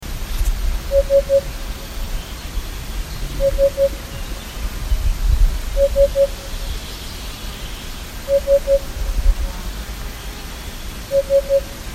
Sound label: hoopoe; דוכיפת; nes harim; senses way